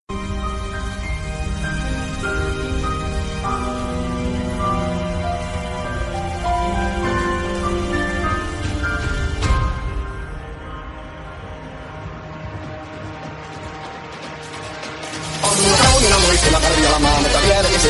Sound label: sakkijarven polkka eurobeat